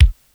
old school short kickdrum sample c sharp key

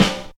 rich low end acoustic snare f sharp key

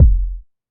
reverb kick drum sample f key

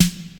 natural snare drum sound a sharp key; 80s

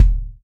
warm sounding bass drum single shot e key

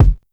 phat bass drum single hit F sharp key; kick drum samples

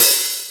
open hat e key

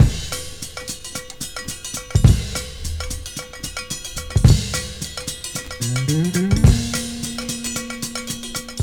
109 bpm drum groove a sharp key; drum loops

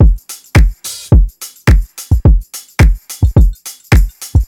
107 bpm hq drum beat g key; drum loop

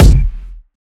large room kick sample F sharp key; kick drum; kick drum samples

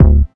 high quality hot kick d key

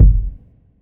ambient kickdrum sound F# key; F sharp; kick drum samples

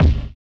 fat kick drum d sharp key

